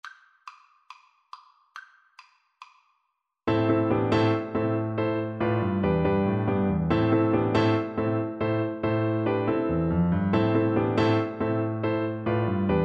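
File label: rock VLC karaoke, Cello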